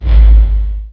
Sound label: Collision Ext